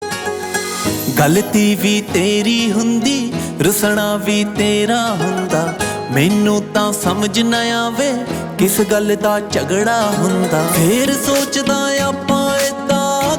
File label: Ringtone